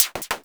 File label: polyvox groove, Polivoks; Formanta Radio Plant